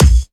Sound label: Bassdrums